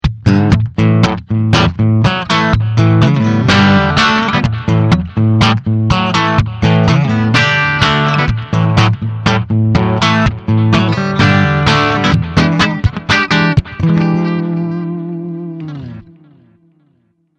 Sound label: Amp clean Fuzz